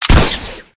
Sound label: grappling hook swing; Golden Crayon Sounds